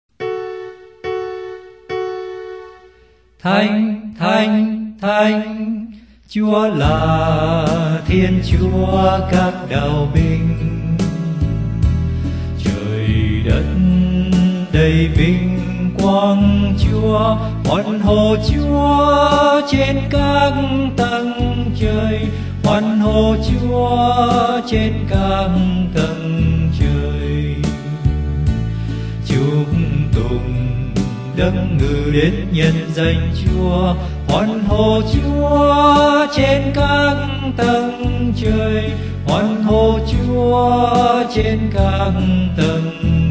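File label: soprano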